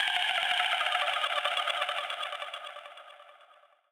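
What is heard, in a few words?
guitar DRIP FX; Rawcutz - Nu Forms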